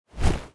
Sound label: Unstable Bat Wings Flap; TANK; 3Souls